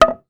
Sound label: Wood 2x4; Tools